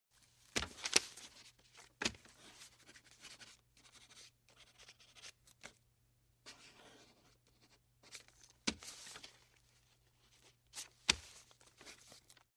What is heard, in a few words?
Paper Writing On Parchment; Hollywood Edge Foley Sound Library